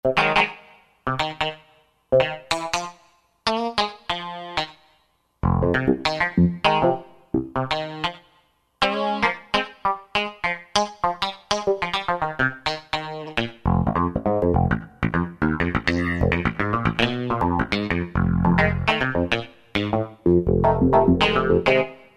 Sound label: Reso Strat, Roland JD-990; Factory Patches